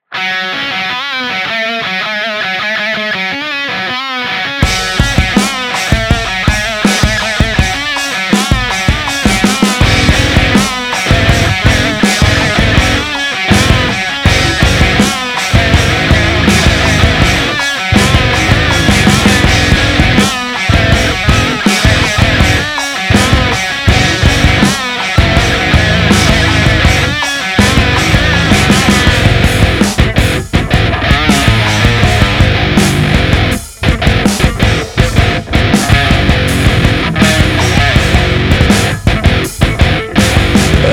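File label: gong sounds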